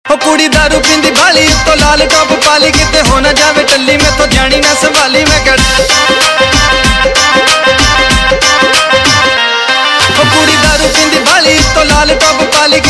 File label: Dhol Mix Ringtone, Punjabi